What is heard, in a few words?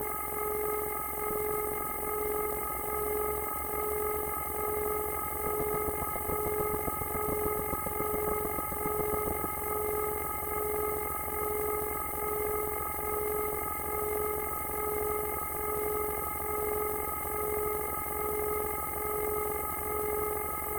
Thinkpad R40e grub python for loop; Pre-OS python forloop Thinkpad R40e; Valentina Vuksic